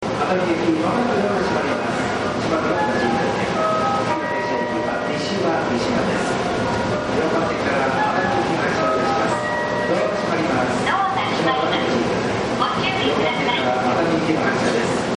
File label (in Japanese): melody; ２５００番台; ３１３系